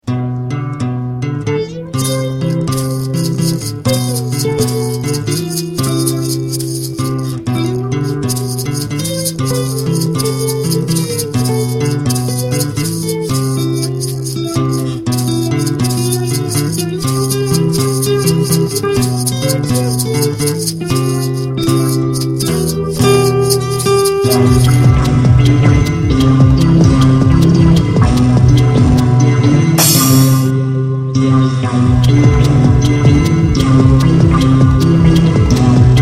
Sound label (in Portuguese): instrumental